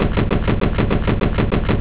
Mortar

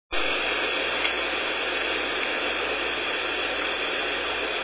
white noise

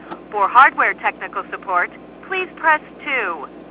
hardware press

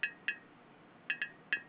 tap

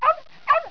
dog

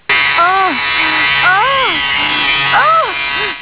vibrator

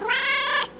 cat shorty

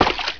splash

